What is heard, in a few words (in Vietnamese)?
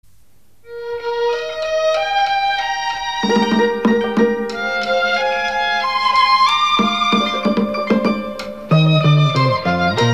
tieng hat ben dong huong canh dan ca kho mu